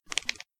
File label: bone break; Paradise